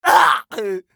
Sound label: scav wound; eft